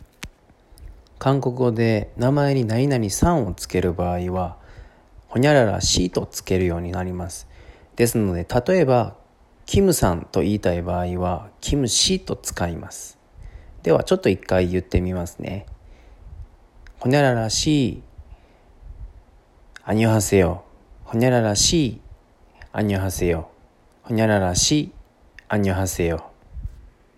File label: aaaooooo